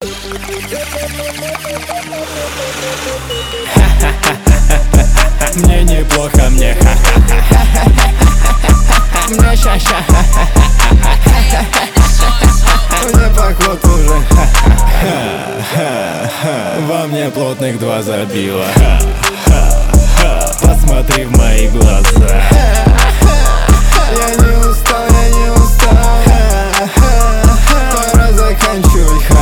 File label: hahahahah